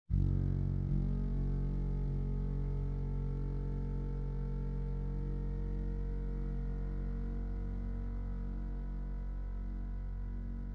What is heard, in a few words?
horn; mirith